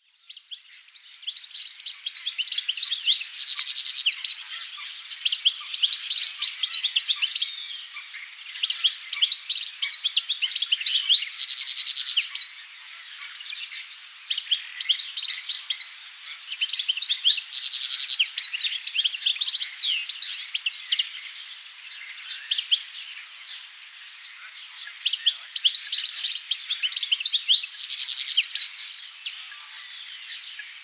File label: Carduelis carduelis; SETE